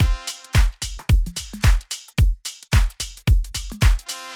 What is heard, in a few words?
Full Drum Beat Unmixed, pop rap